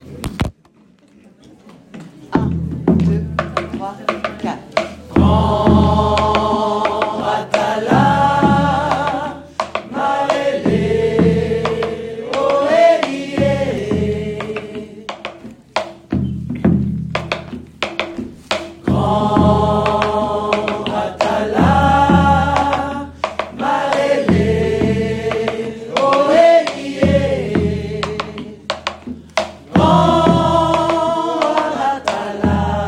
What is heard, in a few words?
Bass, singers; Outdoor-Events; Deblozay